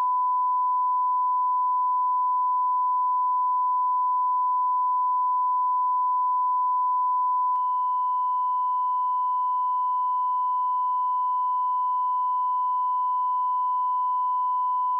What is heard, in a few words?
tone distort; distortion; In this track, distortion components are reduced by another 20dB, equivalent to an overall THD level of 0.01%.